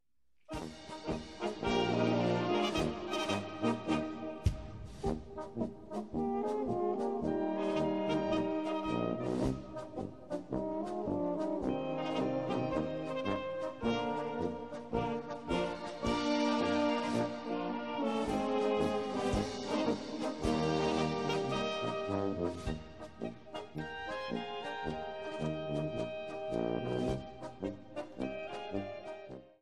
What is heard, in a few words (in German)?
klingelton